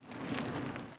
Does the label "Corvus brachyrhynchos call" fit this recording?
No